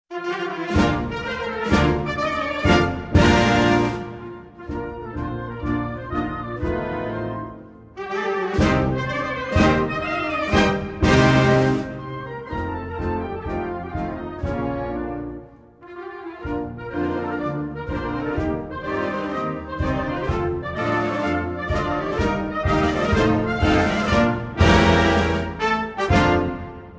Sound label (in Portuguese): hino nacional brasileiro instrumental; playground